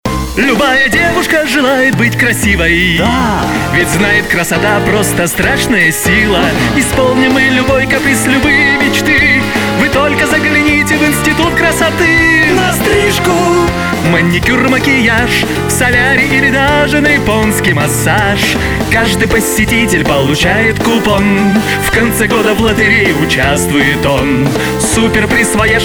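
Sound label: vocal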